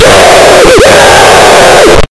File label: scream; exe